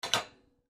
Dishwasher Load Cup; Whirlpool Appliance

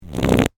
Fart Lift Cheek, Human, Fart, Lift, Cheek; BlastWave

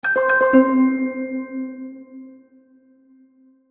Magic Purchase Ding; Cartoon Sound Design

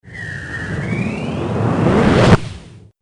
Droid Jet Charging, robot

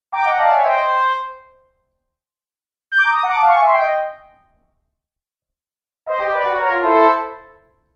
Cartoon Failure Horn Collection; Cartoon Music

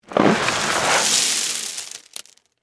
Impact Stones Pour, From, Bin; Rock Sound Effects; Materials; BlastWave

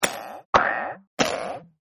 Cartoony Booin-3 Takes; Boings; Cartoons

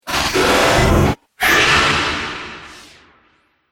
Dubstep Scifi Effects

